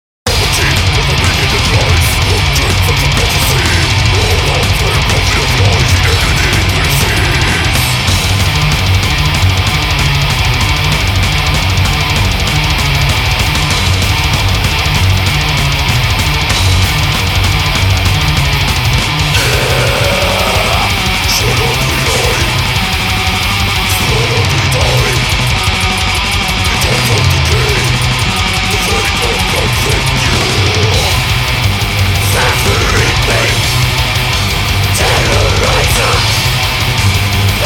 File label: Slaughter at dawn